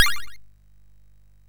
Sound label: sid MOS6581R2; hvsc